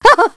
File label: LAUGH; BattleSNDS